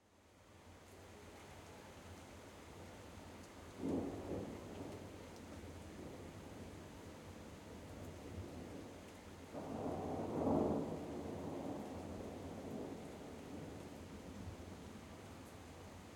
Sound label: Weather, Rain, Light Rain with Thunder Rumbles and Vehicles Passing on Wet Floor; Stereo; Sonik Sound Library; Spatial Rain & Thunders